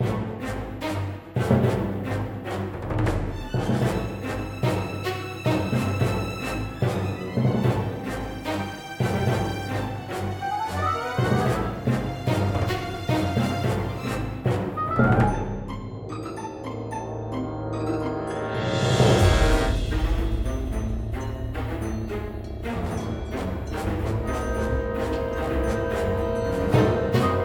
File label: BGM Boss; bellatia; GATA